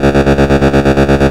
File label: Sawtooth Trem C#1; Ableton Foundation FreePacks; Foundation Glitch Toys & LoFi